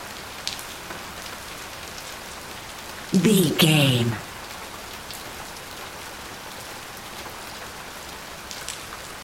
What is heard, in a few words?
rain light hard surface loop